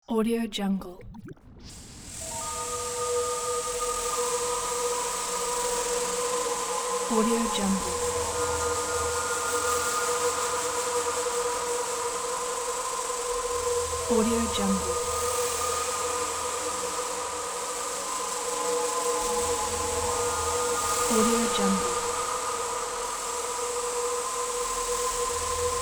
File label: horror film intro background; cinematic-designs